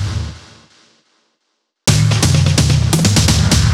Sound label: Fills 128BPM, Clubsounds